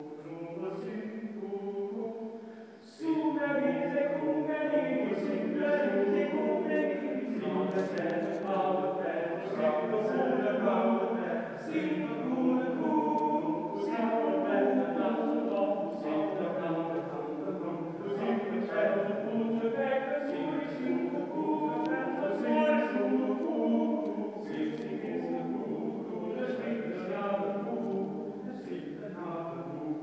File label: snippet of early English music being sung; to illustrate research at uohresfest